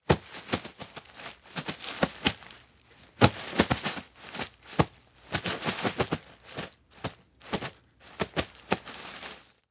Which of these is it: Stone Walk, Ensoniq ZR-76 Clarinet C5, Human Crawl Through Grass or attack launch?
Human Crawl Through Grass